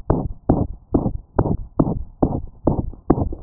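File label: Dog mitral regurgitation severe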